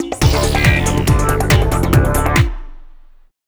Stab; Buzz! Junior Robojam; PS2

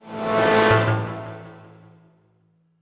Music Boss Stinger Stereo; MushroomBoss

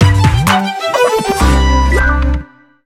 SFX Banner

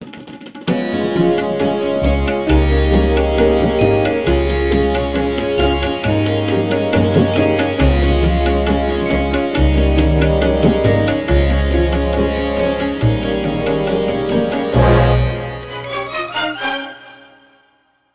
Tuba

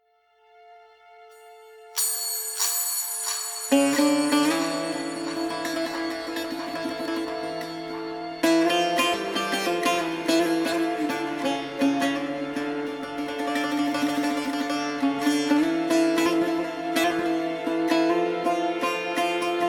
Middle East; Civilization V; JudgementalWound

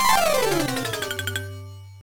MUSIC JINGLE DOWN; ds gamerip 2008; my baby

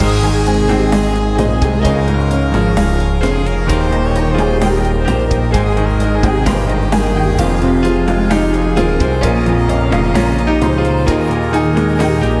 Loop Romantic; Baboon

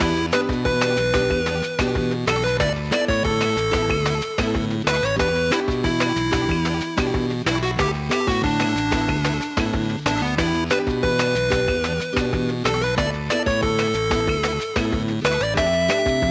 Shop Oasis Loop; Cursed to Golf; PS4 PS5 Switch Windows Xbox One Xbox Series X/S gamerip

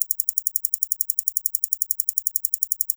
map zoom in

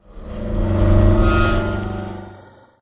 Ambient

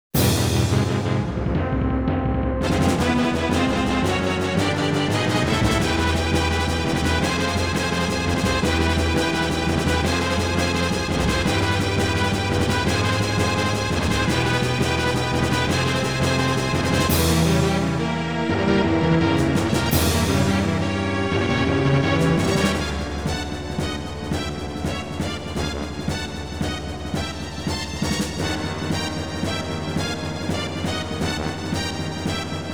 Brass of Victory; Ogre Battle

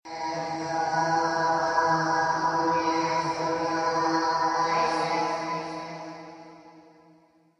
summon Chant

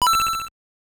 Coin; Mario Bros.; NES

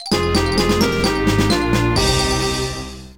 Lose

